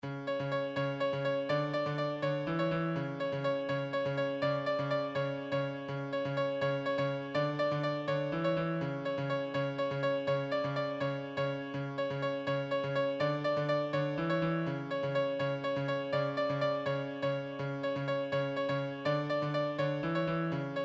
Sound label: solo, piano